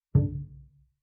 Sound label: Bass pizz ff sul E D3, Double Bass; MIS Pitches - 2014; Strings